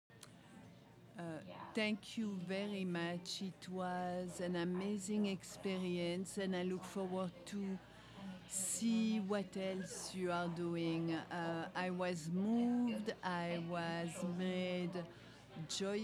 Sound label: Audience Recording; a-meal-premiere